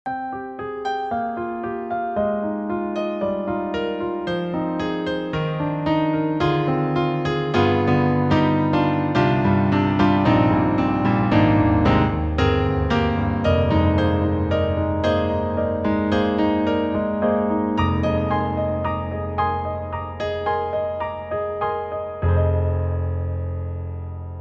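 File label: Roland Juno-Gi European Piano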